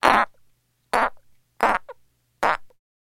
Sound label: Frog Australian Tree